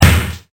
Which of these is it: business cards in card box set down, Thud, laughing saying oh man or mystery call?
Thud